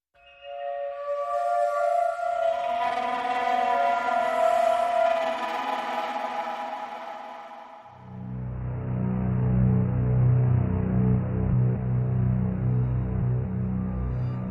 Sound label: Pads Only